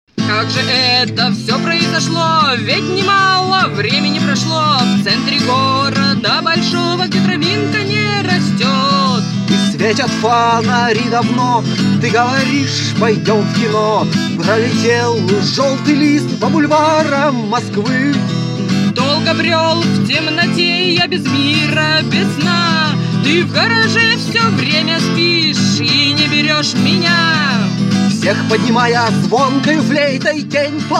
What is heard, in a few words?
rock protest